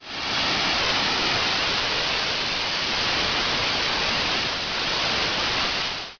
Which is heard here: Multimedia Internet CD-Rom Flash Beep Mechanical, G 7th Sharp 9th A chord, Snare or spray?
spray